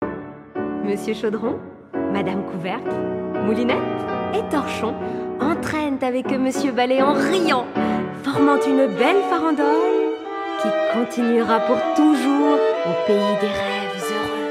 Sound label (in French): Farandole; la revue de cuisine